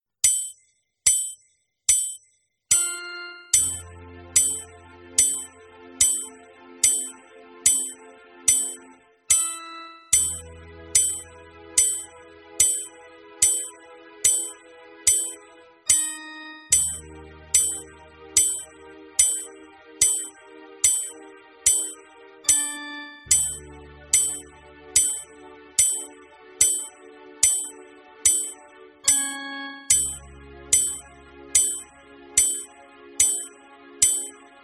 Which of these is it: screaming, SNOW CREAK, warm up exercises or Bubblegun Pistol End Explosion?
warm up exercises